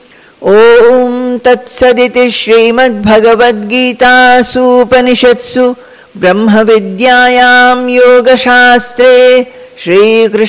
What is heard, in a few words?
Ending Chanting; chapter 8 aksara brahma yoga; bhagavad gita; conclusion